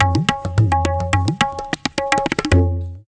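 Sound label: tabla toot; Musical Instruments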